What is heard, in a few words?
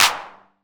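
909 clap; PERC TR; Wizoo - Platinum 24 Electronic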